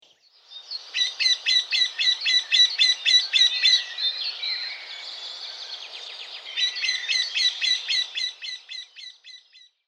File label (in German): wendehals